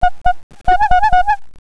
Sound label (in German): hupe